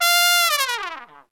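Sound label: trumpet long fall; OMI - Universe of Sounds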